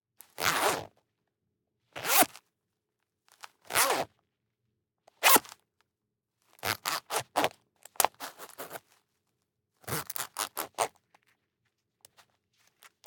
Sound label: Neoprene Dry Wetsuit; TheWorkRoom Audio Post